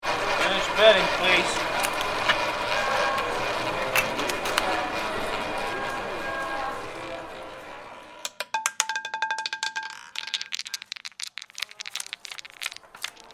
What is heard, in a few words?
Casino; Big Room Sound